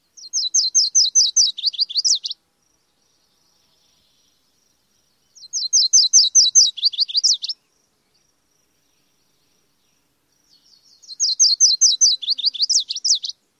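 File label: Virginia's warbler songs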